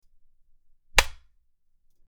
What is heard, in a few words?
Slap